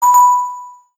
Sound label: tutorial sound